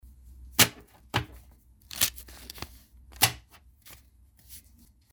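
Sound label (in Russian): подравнивают доллары на столе и обертывают резинкой; Звук купюр, долларов; Звук купюр, долларов, отдельно и в пачках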